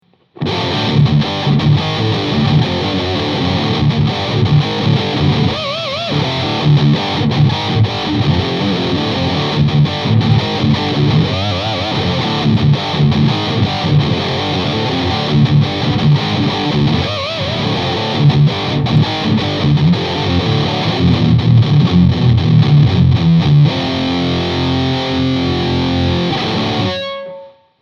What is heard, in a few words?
Black Spirit 200 Combo High Gain